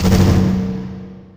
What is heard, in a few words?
cyber explode, weapons; Dystopia